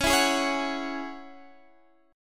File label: E 6th Add 9th; chord shape